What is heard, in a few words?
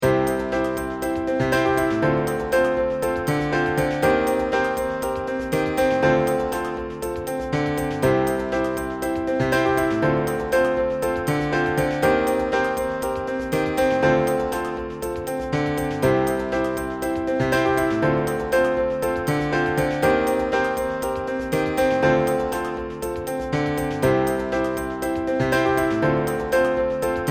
instrumental